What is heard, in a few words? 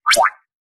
pyoko